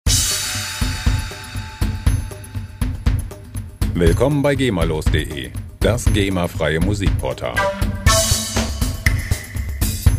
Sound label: ethno drums